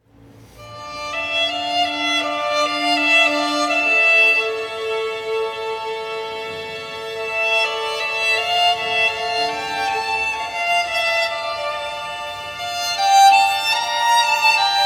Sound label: Concerto for Violin and Strings in a Minor, Largo; L' Estro Armonico